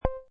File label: Classy, Button sound or menu sound; Multimedia